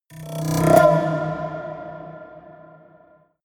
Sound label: haunted strings roll high